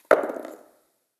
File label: dice; FNV